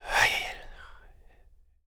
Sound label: CREATURE GHOST Male, Whisper, Breathe, Language, Short, DRY; Articulated Sounds; Ghosts Return